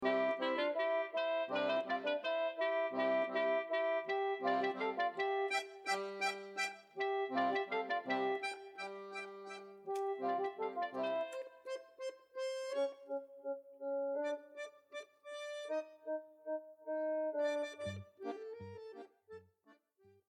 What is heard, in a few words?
Gavotte